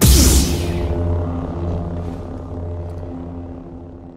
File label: power on